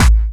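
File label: snare drum bass drum, Dance Mega Drums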